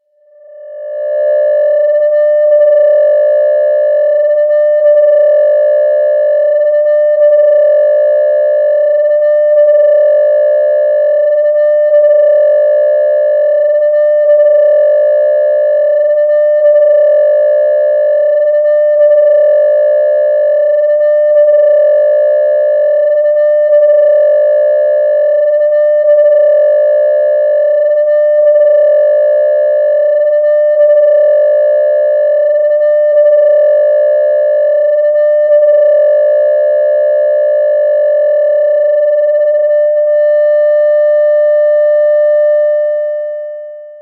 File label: phasing; TOS